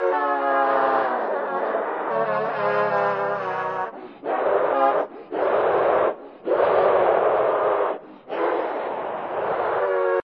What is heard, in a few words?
Screamer Loop; criminality